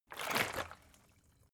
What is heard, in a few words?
bottle water big upend; berufe